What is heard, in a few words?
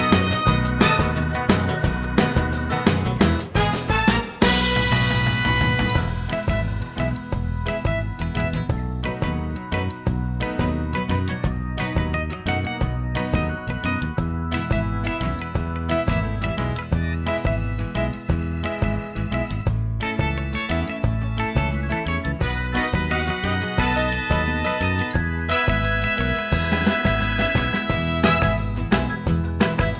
medley E